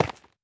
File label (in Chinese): 滴水石 破坏声